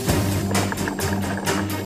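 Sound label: mountain world music cage forcefield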